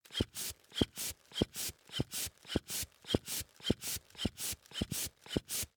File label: Hand bellows, leather, wood, brass, pumping, wheezing, blowing, supple, slow; Eiravaein Works; Vaeyan IV